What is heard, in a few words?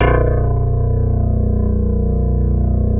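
bass C2; Greytsounds; Scott Peers Sound Engineering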